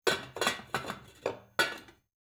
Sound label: pot lid close